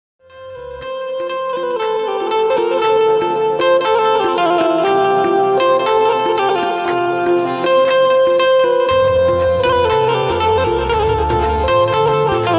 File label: intrumental ringtone benjo rington